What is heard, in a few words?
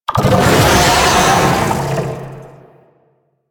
Attack, Vocals; Proto Infested Babau